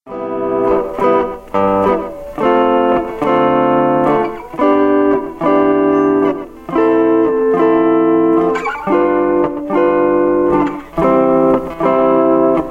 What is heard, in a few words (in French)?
Suite Accords